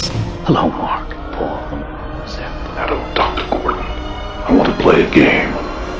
Saw Hello

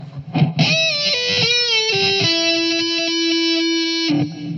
Guitar Solo

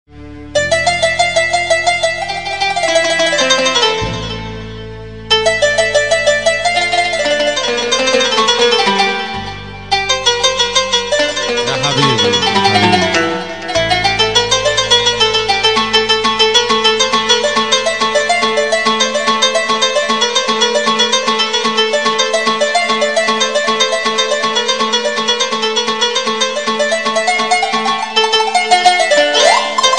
Marimba

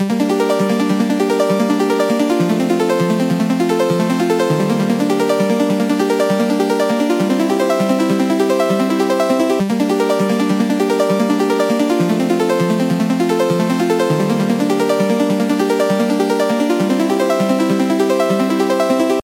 JRPG Music

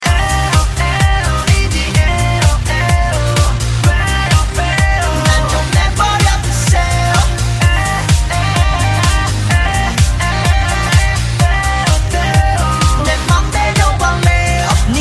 Korean Dream

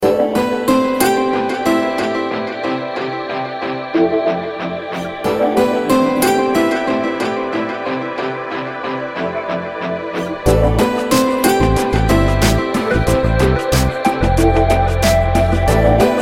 Ringtone

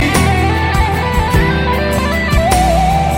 Guitar Msg tone

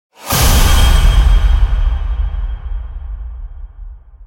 Hero Picked

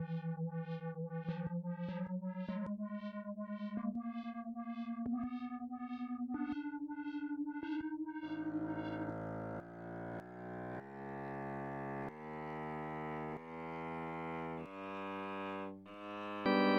E Flat harmonic Scal

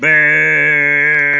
Boo; Cleveland Strong

